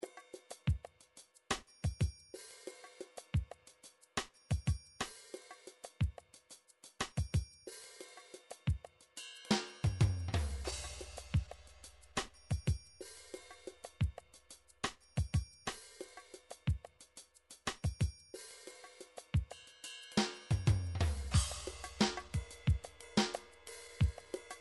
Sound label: drum midi files latin; Drum Midi Files Collection